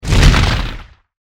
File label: fire hit